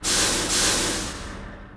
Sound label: vehicle